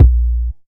tr 808 synth kick drum a sharp key, tr 808 analog synth drum kick tuned to the A# note; kick drum samples